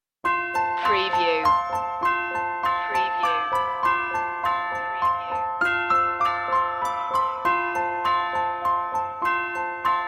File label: orchestral loop intro; away in a manger; media music now